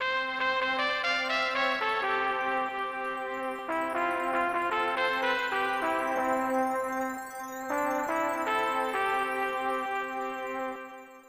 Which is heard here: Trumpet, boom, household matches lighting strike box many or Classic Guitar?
Trumpet